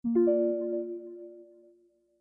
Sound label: Unlock; alarm